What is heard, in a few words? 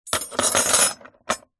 handcuffs metal heavy set down on wood surface